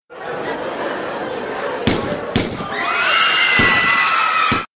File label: Gunfire In Crowd; KevanGC